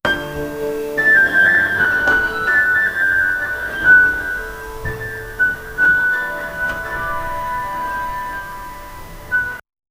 tokyo sound effect, japan

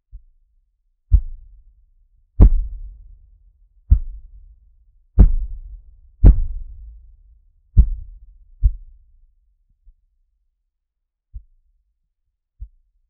the sound of footsteps in; drone

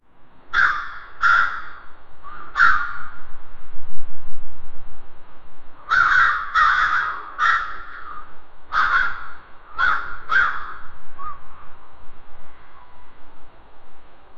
crows in the garden slowe

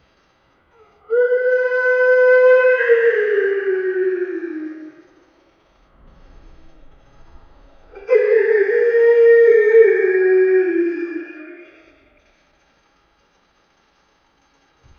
slow crying tearful eyes, sadness

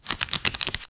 a card deck shuffle

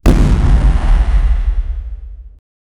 sonic boom and teleportin

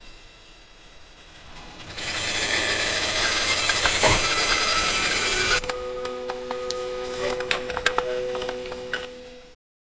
professional lab work sou, laboratory

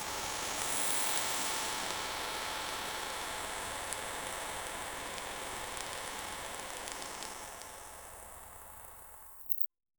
hot spark cracking of hot, lava

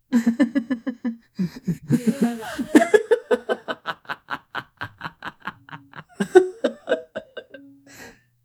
natural friendly duet, adult

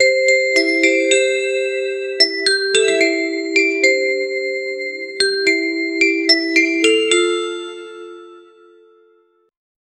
a strong melodic 3 note, upbeat

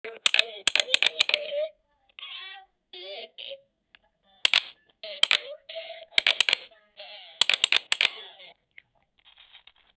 mobile

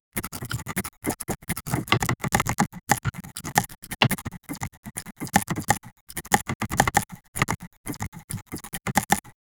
create asmr sound effects, slicing

